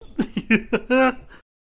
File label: goofy laugh